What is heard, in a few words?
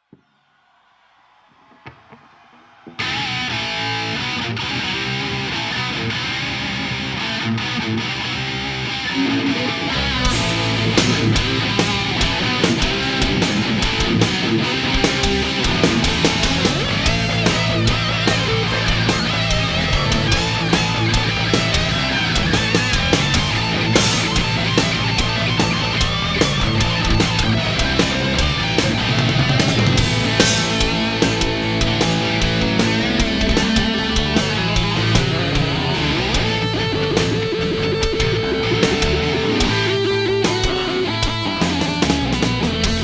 Encore Jam - Live; at Arco Arena, Sacramento, CA; January 11th, 1992